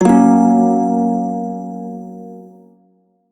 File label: Prime Shawzin Yo Chord, Music; Warframe